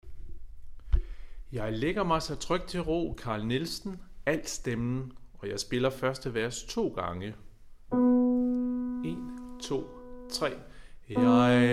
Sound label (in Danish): alt, koret; Jeg lægger mig så trygt til ro; harlev koret